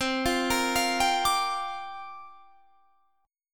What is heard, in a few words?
C 9th Suspended 4th down Guitar Standard; C9sus4 arpeggiated; JGuitar